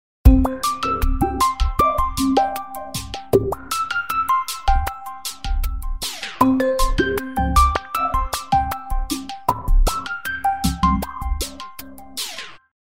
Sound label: ringtong; Gionee GN205